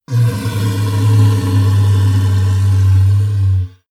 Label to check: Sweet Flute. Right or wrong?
Wrong